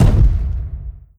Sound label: step layer bass; combine; soldier charger